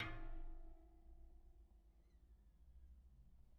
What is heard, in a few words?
Bicycle Spoke